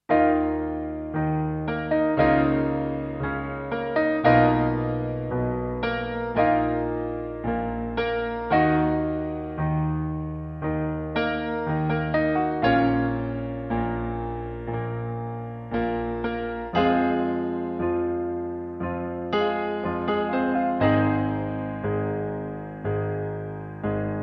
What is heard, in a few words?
piano